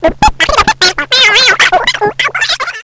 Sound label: boop beep